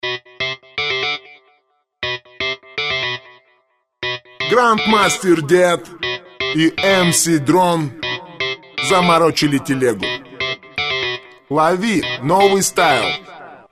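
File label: drone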